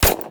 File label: smg close; plague